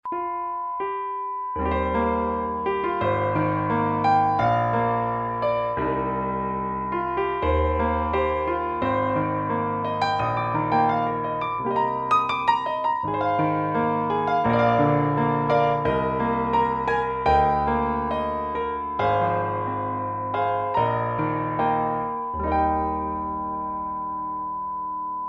air groove

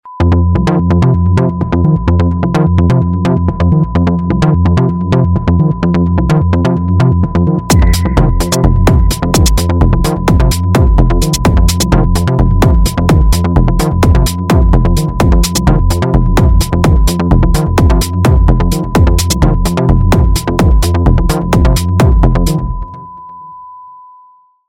air groove

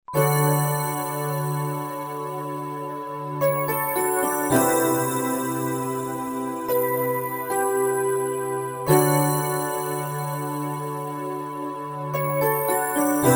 MUSIC BOX

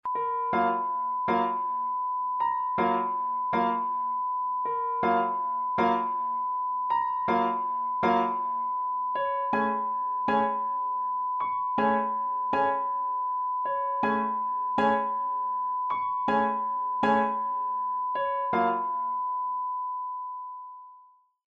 air groove

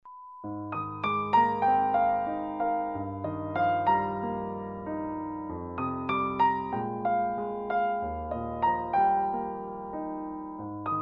artless piano

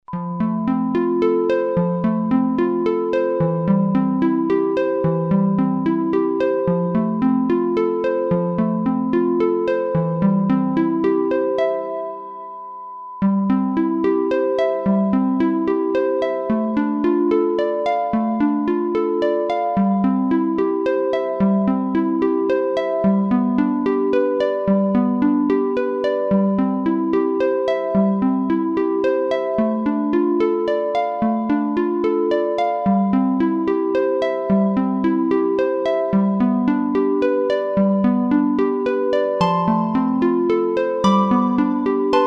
MUSIC BOX

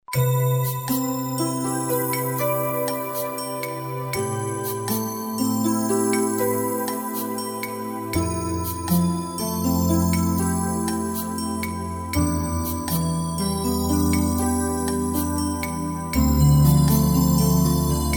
MUSIC BOX

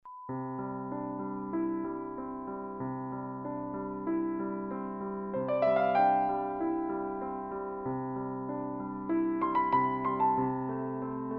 artless piano

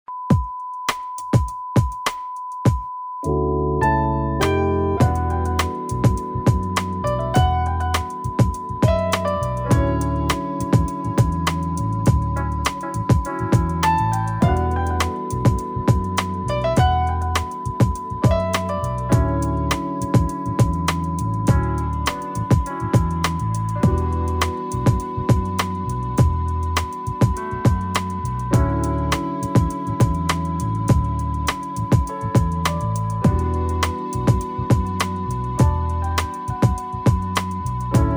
smooth melody

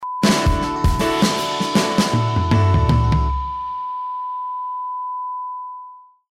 Jingle

